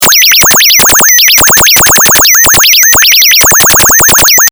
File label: fm synths